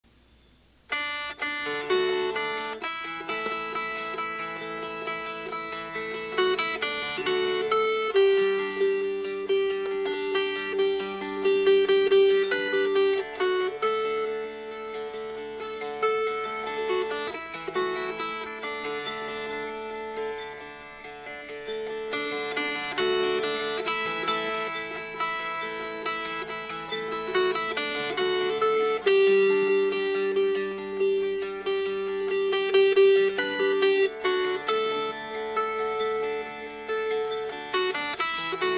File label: Fingerpicked; I Never Will Marry